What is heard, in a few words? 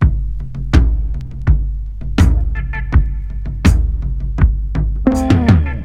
82 bpm breakbeat sample D key